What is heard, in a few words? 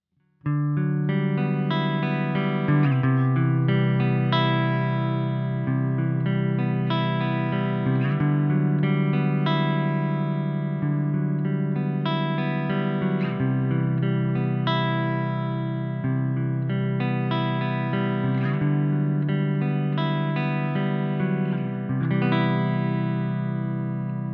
Katana clean EQ scoop